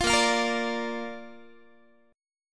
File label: Bb Major Bb down Guitar, chord; strummed; 20,18,20,20,18,x